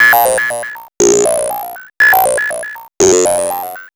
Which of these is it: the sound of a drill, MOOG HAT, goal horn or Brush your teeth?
MOOG HAT